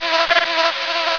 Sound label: fly